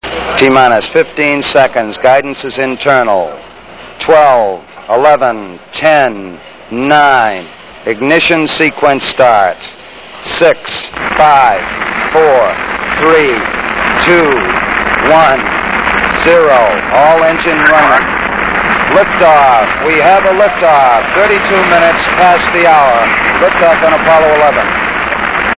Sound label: launch; apollo11